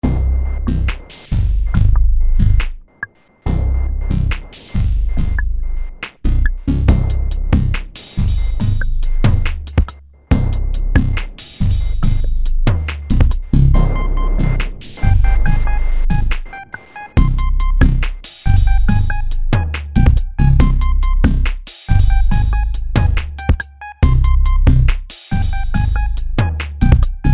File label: example beat